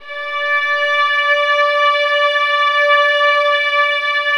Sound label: VIOLINS E, Synclavier Strings; ILIO